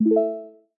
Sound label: joined, videoconferencing